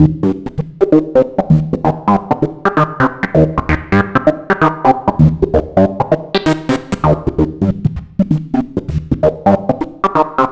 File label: Tippy Tap; KJ Sawka; Drum n Bass & Beyond